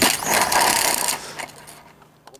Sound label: crash